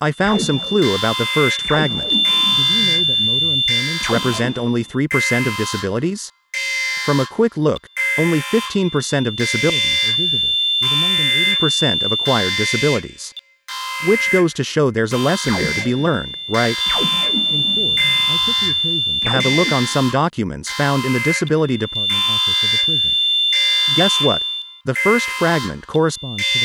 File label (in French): Enregistrement avec accouphenes ENG